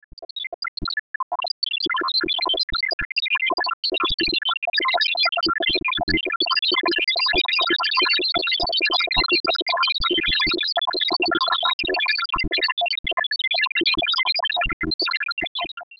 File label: sinz, inharmonic